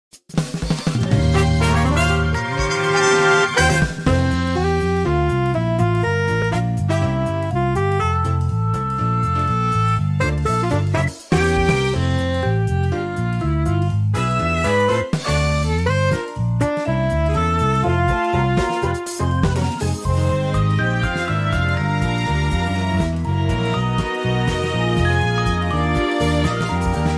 Theme; MKT; Squeaky Clean Sprint

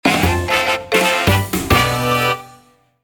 Menu Jingle

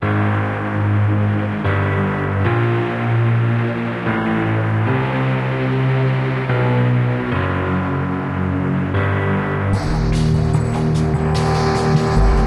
Nightmare Lullaby

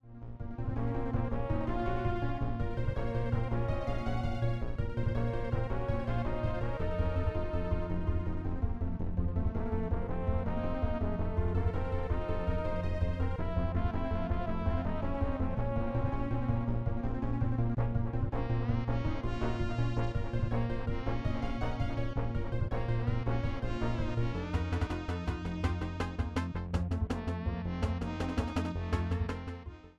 Swing portion; DKC; Mine Cart Madness

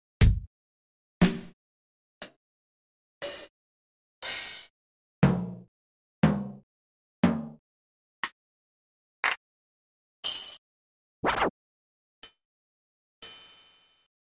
normal Drums Instruments

